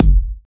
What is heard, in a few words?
kick drum single hit g key